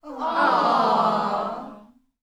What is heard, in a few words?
wrong answer